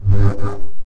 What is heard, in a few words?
deep laser pulse sounds